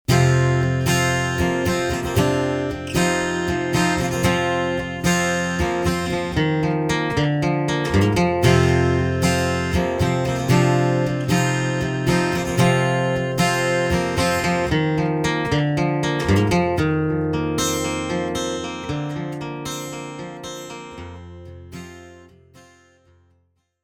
Ribbon Mic with external mix

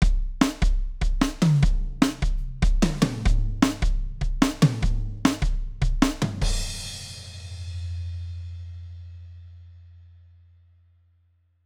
Filter Smack

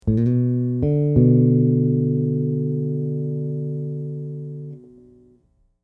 B to D m3, bass; chords and double stops on bass

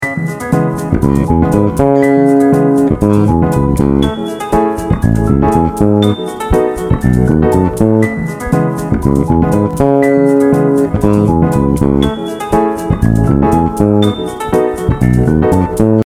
Emajor, C#mi7 – Ama7 – B7; bass; If we substitute the vi chord (C#mi7) for the I chord (Ema7), the progression becomes C#mi7 – Ama7 – B7; a bassist's guide to chord substitutions

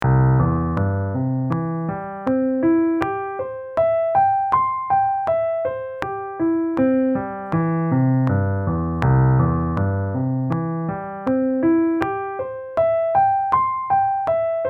with metronome

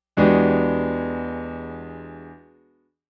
Funk Clash

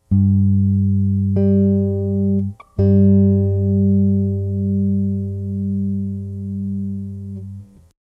Octave, bass; chords and double stops on bass

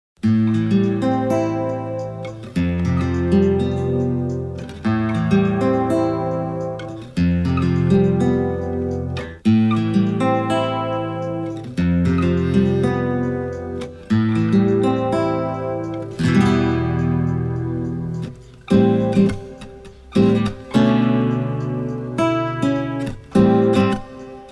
DI left mic right

